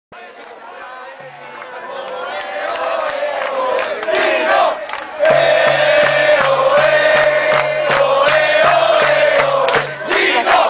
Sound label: eh oh eh, fanchants, chants; tino